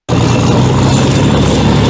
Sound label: Tank move